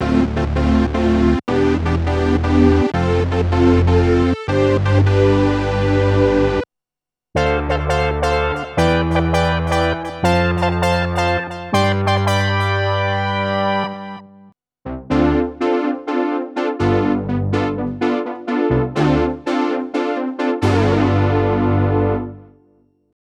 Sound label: brass analog, waldorf blofeld